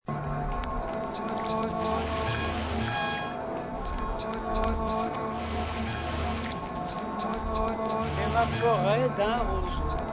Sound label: AMBIENT EXPERIMENTAL